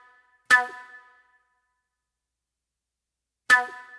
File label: Spit Plate Flute